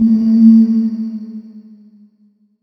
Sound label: Buzz Yellow Tail; Glow Artisan; DSiWare; nds